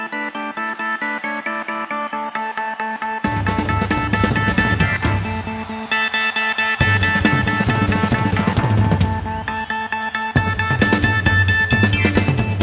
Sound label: Drum Solo